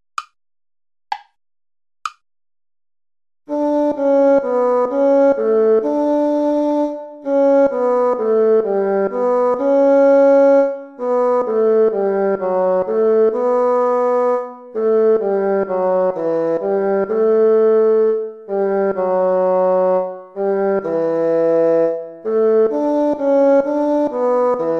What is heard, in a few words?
minuet